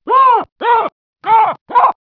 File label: bark graded seq, Baboon